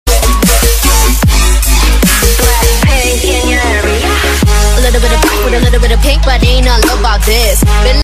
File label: trap dub remix